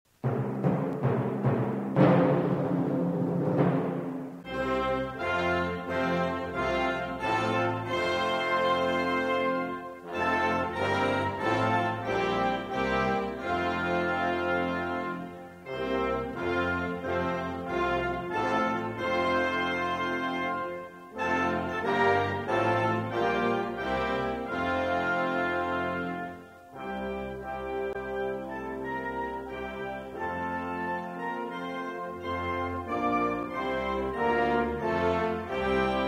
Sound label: Nigeria National Anthem